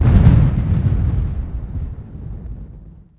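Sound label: thunder far; envrn; The Elder Scrolls III Morrowind GOTY; XBOX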